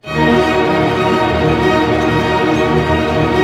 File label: STR ARP MI, Symphony Orchestra, STR Arpeggio; Roland LCDP08 Symphony Orchestra; STR Arpeggios